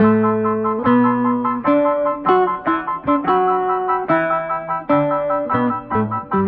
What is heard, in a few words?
guitar